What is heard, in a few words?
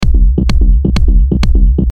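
140 explosion kick and hi bass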